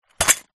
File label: broken picture frame containing loose glass shards place down; zapsplat